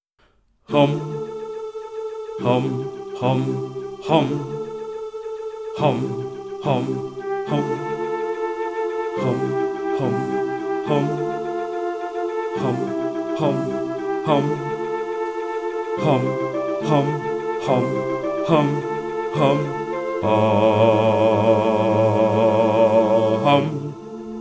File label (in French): basses